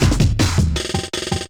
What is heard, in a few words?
DRUMLOOP D+B 160, Drum+Bass Loop; eJay